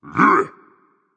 grunt attack berserk; cuchulainn

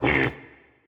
grunt; smite; artemis v3 skin15

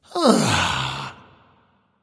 "ugh"; smite; voicepack; ullr

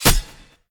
hit; Smite; god Khepri

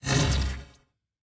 sickle projectile; smite; osiris

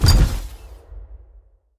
giant run; smite; vamana

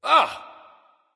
"ah", discover; smite; voicepack; ullr

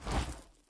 swish; god ares; smite; ares v4 skin16

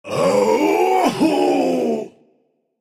Ouch; bakasura

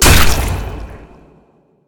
hit large; smite; odin

